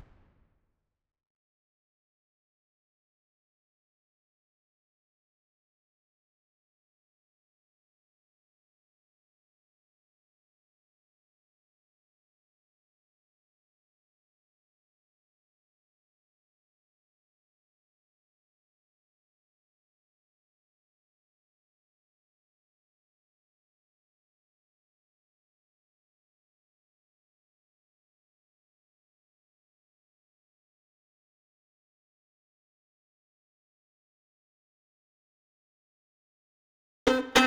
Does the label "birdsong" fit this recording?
No